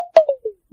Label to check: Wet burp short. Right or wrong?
Wrong